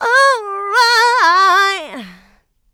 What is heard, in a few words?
Female Vocals; Houseworx